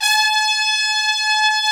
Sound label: BRS TPTS, Brass Sections; Roland LCDP06 Brass Sections; Tpts Sec.1